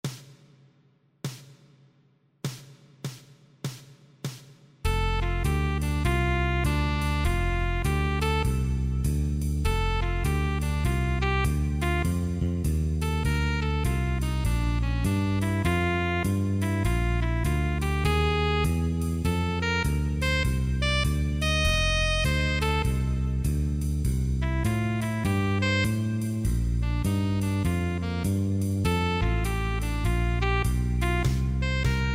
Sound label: play along piano